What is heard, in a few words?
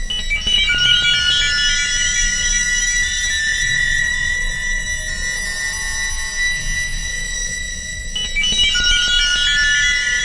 psychology chimes; Pads